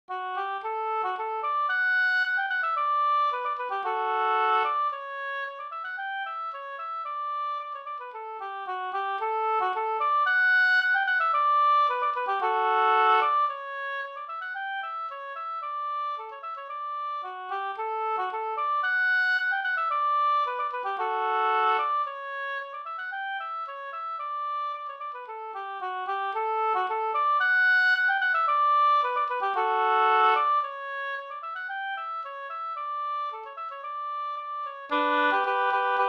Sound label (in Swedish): ganglat; fr Harnosand av Osvald Wallin An